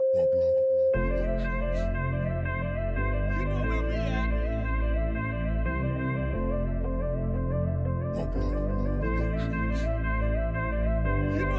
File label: POP EDM